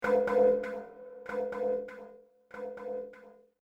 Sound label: djset